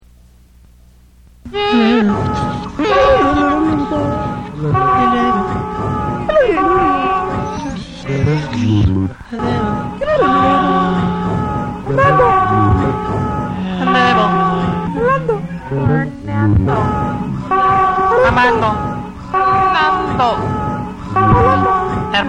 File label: maraca; ciat-lonbarde